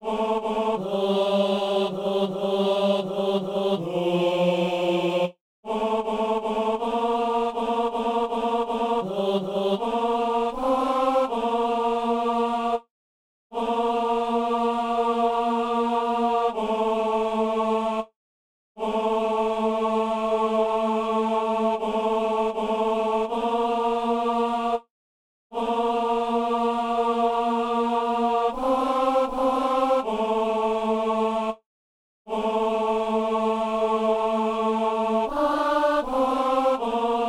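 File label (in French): psaume, ténor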